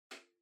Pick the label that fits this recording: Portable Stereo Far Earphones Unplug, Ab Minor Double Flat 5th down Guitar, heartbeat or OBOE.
Portable Stereo Far Earphones Unplug